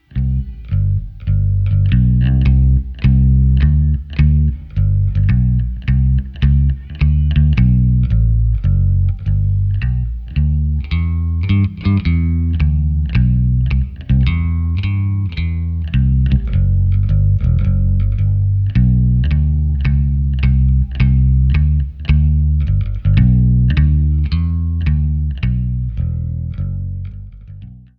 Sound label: Walkin Bass Direct